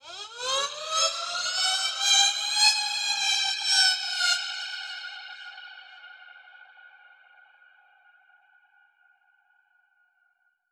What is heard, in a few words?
alarm siren, Verb Proc, 110bpm; musicradar